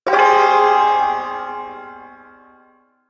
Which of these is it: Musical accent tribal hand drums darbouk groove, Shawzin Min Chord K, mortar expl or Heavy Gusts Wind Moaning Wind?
Shawzin Min Chord K